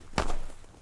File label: FOOTSTEP Trainers Snow Compact Walk Slow; Phasmophobia